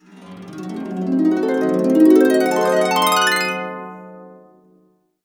C DIM7 CA UP, CASCADE UP, Complete Harps; USB Soundscan vol.62; AKAI

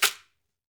SHAK, SHAKERS; Best Service Dance Mega Drums

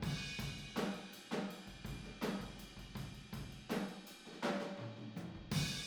Boogaloo Fill Room ST, 82 BPM, Drums; Sonic Foundry (Sony Creative Software) - Drums from the Big Room The Mixes

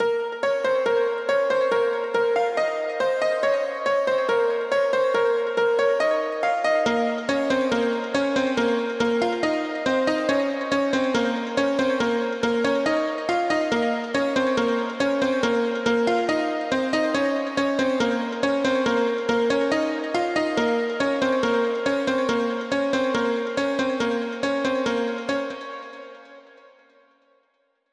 Break Piano Wet, 140 BPM; Vengeance - Essential Clubsounds Vol.5; Demo Constr Kits; Catch a Plane Kit; Root A#min; Synth

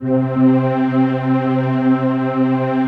PAD, Dance Mega Synth

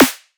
Snare

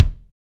BD, DR BD; Best Service Gigapack 2

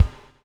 BUBL, BUBBLE; Spectrasonics - Backbeat

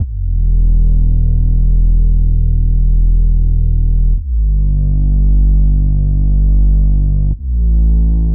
E Bass Sub Droner, 115; Zero-G; Future Wave

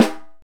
SNARE C3; Greytsounds VOL-1; SDX SNARES

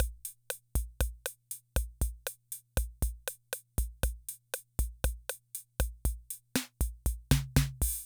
Loop, rhythm 55, classic machines

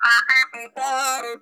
CARTOON, Funk Guitar; Zero G - Funk Guitar

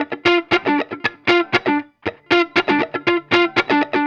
Guitar, 70s Analog Funk; Sonic Foundry (Sony Creative Software); What it Is! 70s Analog Funk

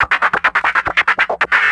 Vocal Scratches 140BPM

